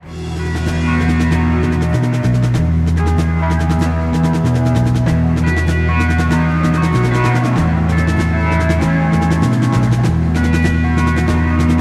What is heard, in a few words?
leslie